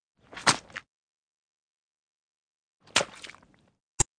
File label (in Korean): Fights, 싸움-전투; 헐리웃특수효과음